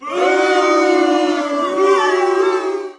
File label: booooooooooo